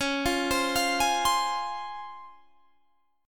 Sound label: C sharp Major 7th C sharp, Guitar Standard; C#M7 arpeggiated; 21,20,22,22,21,20; JGuitar